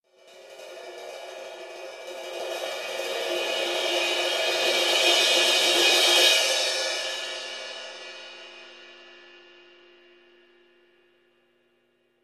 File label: Long drum cymbal climax